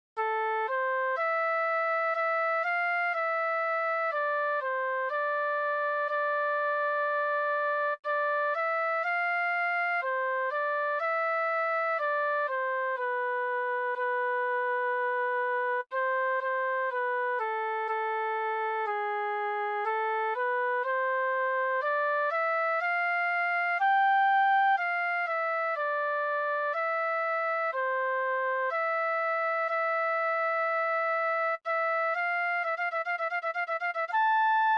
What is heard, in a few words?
Flute